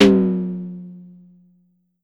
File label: Drum X Tom, Pearl